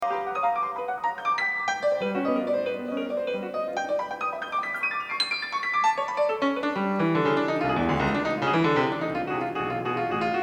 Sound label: Piano Sonata